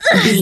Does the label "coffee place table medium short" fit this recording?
No